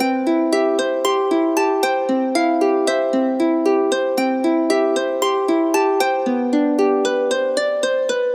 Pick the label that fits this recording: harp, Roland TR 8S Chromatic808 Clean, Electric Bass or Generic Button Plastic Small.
harp